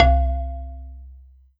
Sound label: Roland JV-2080 Bass Marimba C2